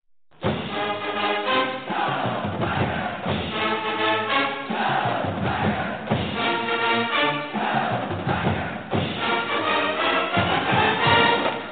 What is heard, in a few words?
Cheer; LSU